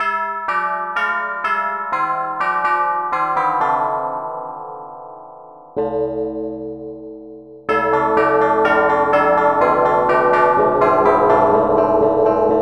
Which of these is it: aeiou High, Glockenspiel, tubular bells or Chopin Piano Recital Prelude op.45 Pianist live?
tubular bells